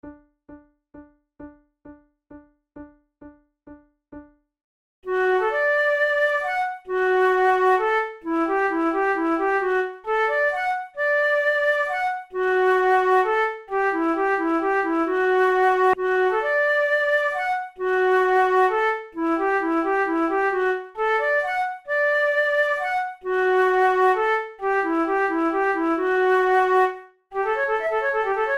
post horn waltz duet, flute

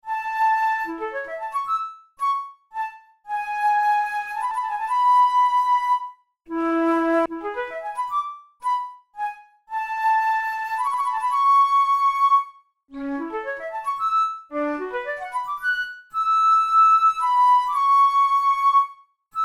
studies for the boehm flute; drouet 72

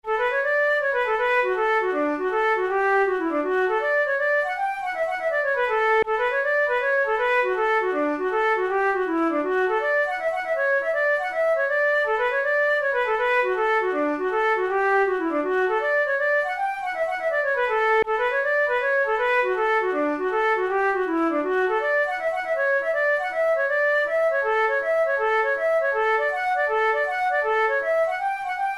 hornpipe, flute

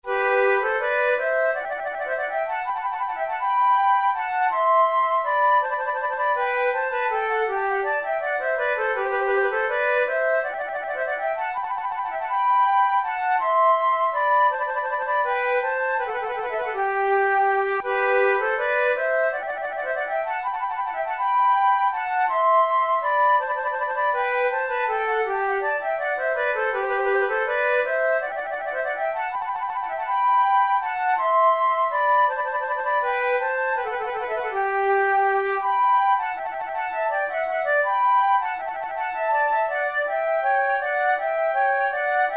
rondeau, flute tunes; naudot; 6 babioles op10 no5

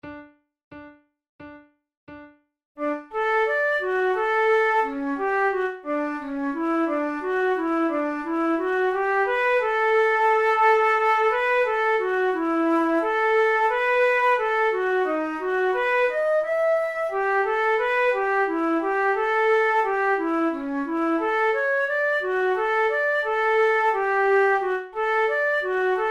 duets, flute; forty progressive duets

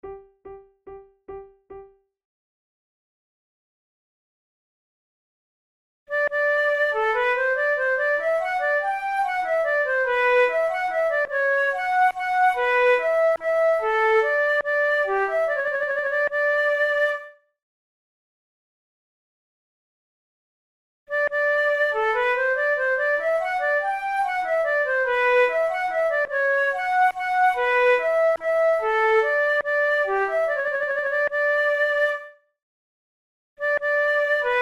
courante

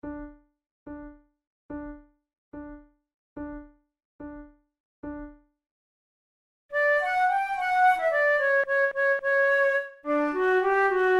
duet, flute

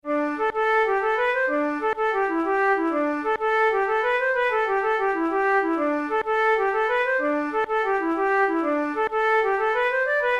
slip jig, flute

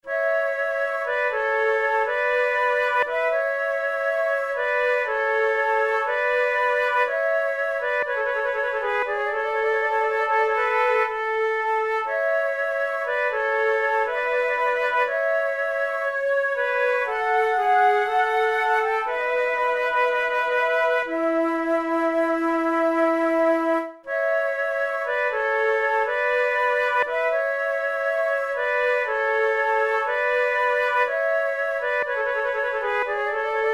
concerts for two flutes sarabande; Monteclair; flute tunes

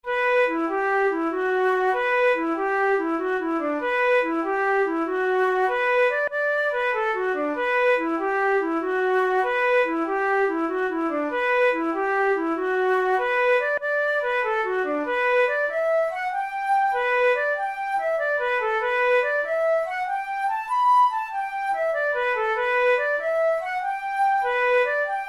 slip jig, flute

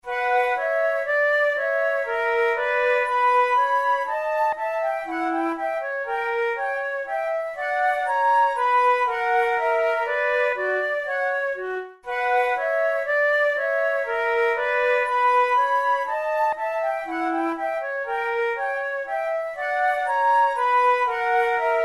duos grazioso, flute; devienne; nouvelle methode pour la flute 18

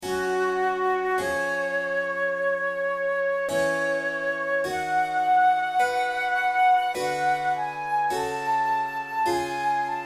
corelli violin sonata op5 no9 iii adagio, flute

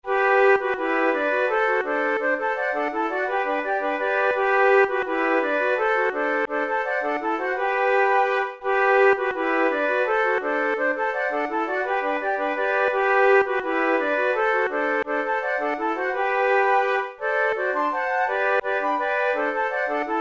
waltz trio

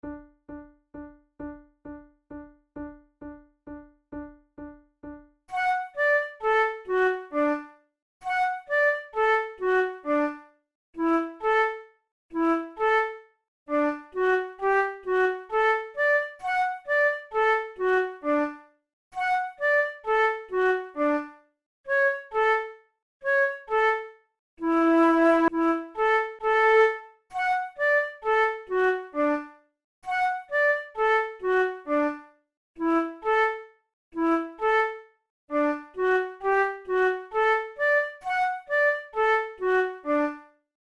methode de flute; tulou; Play-along accompaniment

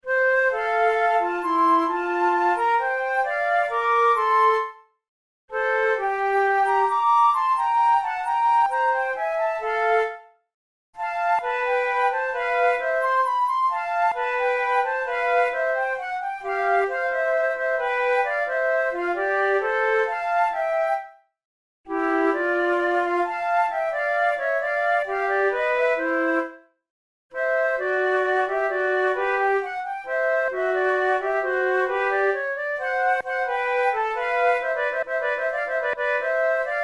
duet larghetto

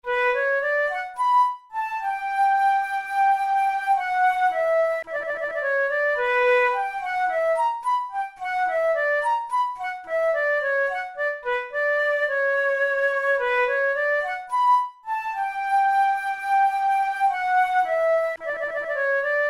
minuet, flute

